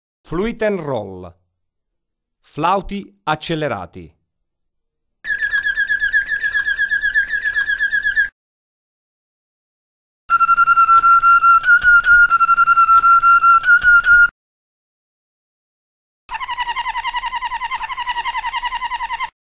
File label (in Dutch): Fluitrol, waterslager